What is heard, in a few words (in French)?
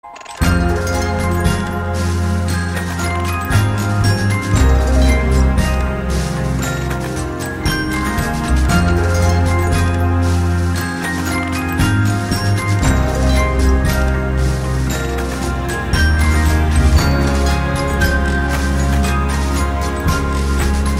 La Boite à Musique